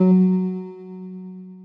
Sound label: Strum; Okitoki Games; EasyTalk